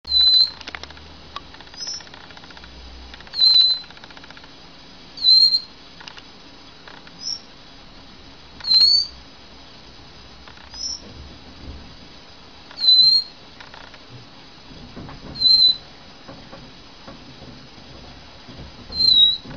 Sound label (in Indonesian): pin tailed whydah burung finch berekor panjang bisa bersiul dan berkicau